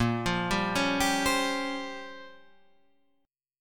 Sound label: A sharp Minor 6th Add 9th A sharp, Guitar chord; A#m6add9 Chord {6 8 5 6 8 8} arpeggiated